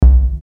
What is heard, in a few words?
HIT BASS PHASE; Blastwave